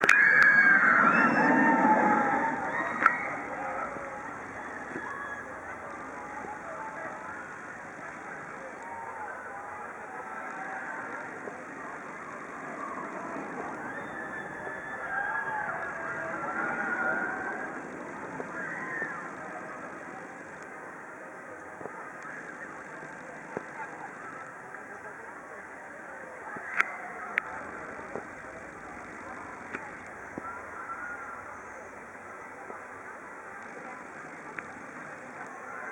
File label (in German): Achterbahnfahrt; blue fire